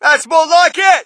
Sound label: crowd, shout; Mike Tyson Heavyweight Boxing